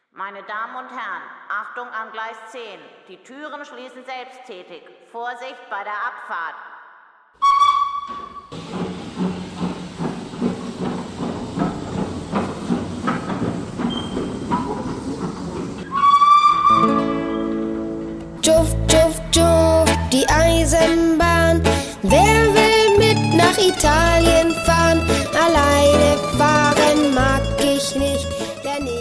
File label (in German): Tschuff Eisenbahn; Kikus CD Guten Morgen